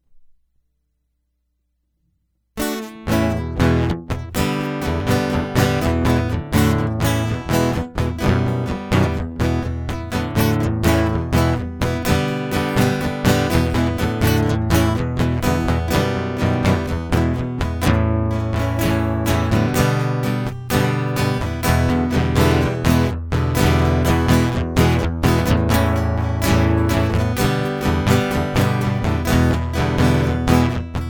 Guitar Loop

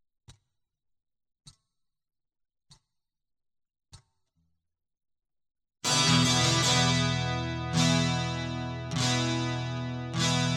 Guitar Loop

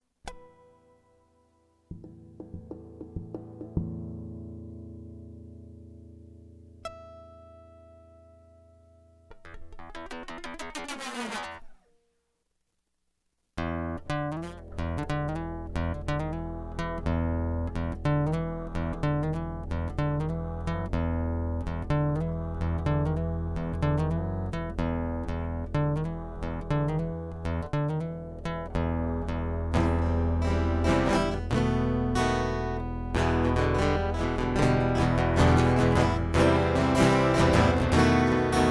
Wrapped up in a Feedback Loop Interlude

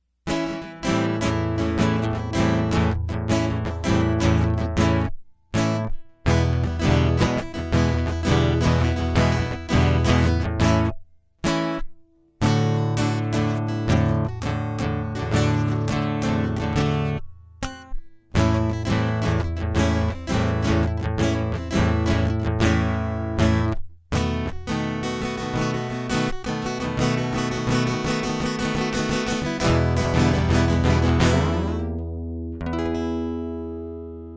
Guitar Loop

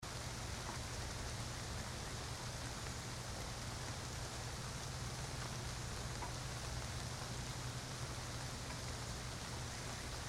Thunderstorm Loop

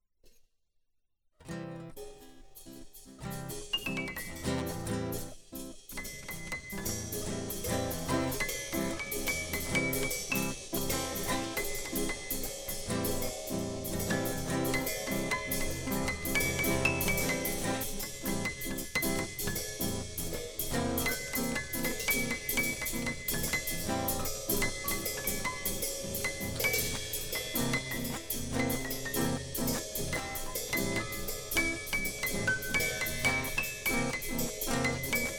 Instrumental; hyper terrestrial

